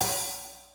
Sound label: half hat long